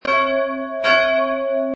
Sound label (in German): glocke des; glocken